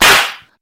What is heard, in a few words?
slap